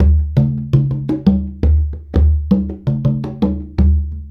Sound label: Congas; Essential Percussion